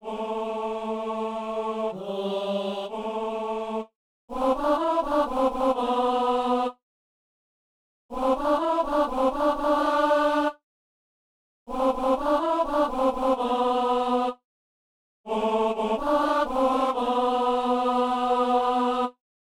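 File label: tenor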